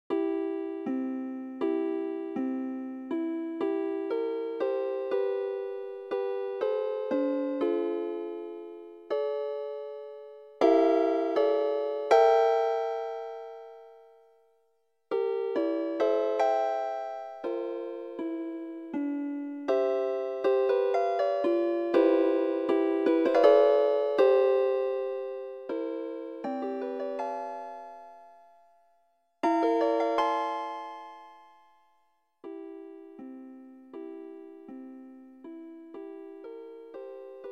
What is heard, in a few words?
7 lim octony lullaby